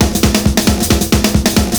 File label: PERCS, 70 s Breakbeats